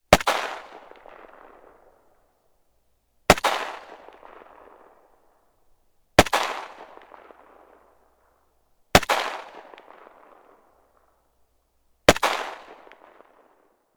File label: gun ak 47; still north media